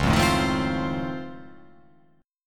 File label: C sharp Augmented Major 9th down, guitar chord